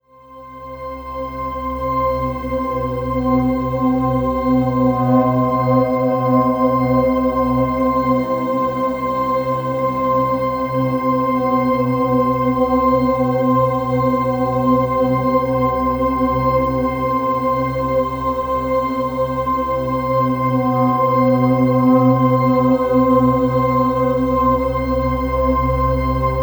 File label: Atmosphere Perception C; Ghosthack